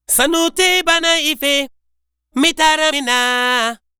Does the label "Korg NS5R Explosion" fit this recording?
No